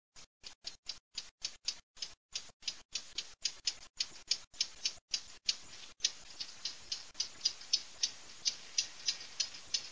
CAPINERA

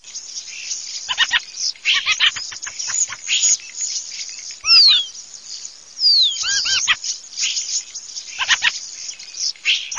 CESENA STORNI

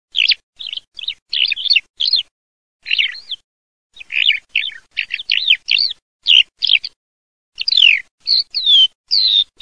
allodola, birdsound

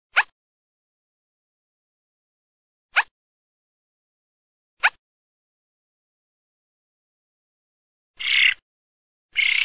SPATOLA

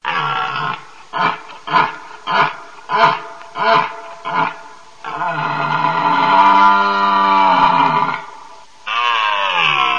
CERVO, birdsound

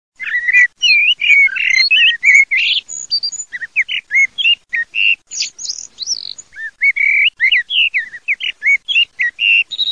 MERLO MERLO

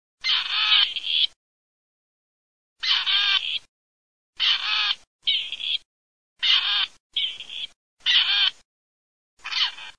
STARNA PERDIX STARNA

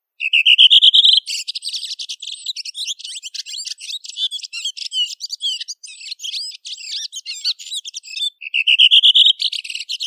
Tordo sassello

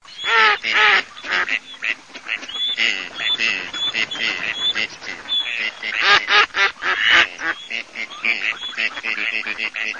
GERMANO CANAPIGLIE

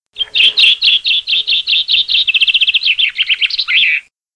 FRIN BACCIN

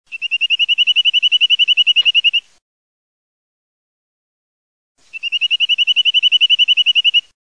PICCHIO MURATORE CORSO, birdsound

